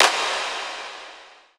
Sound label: Clap Bm Dark Wet